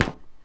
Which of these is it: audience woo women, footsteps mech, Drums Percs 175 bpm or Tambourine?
footsteps mech